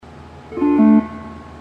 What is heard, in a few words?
hawaiian piece